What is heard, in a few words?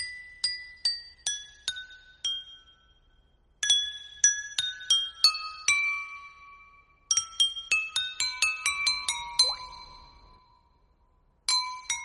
jal tarang instrumental